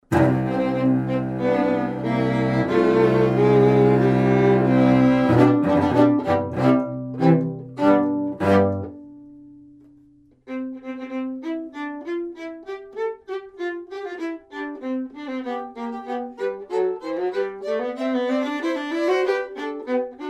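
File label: string trio; hopfe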